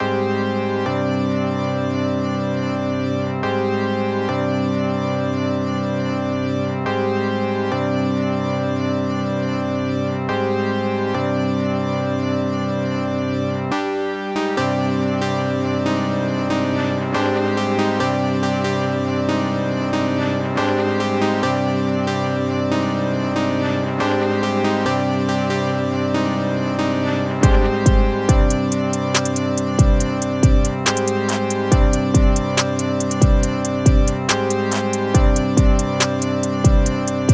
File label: tone